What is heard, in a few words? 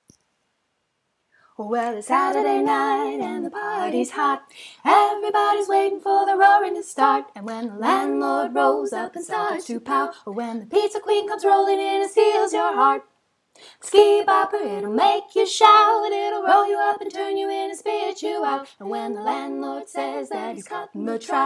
Jingle